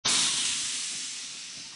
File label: car suspension